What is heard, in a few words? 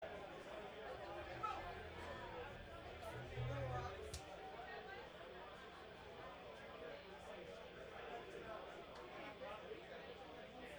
tuning